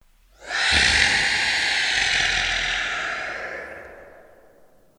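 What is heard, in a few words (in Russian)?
монстры переходы кино и звуковые эффекты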